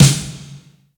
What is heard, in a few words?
loud snare single hit c sharp key